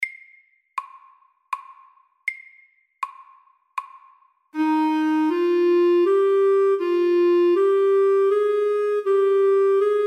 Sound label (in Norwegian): Etyde MIDDELS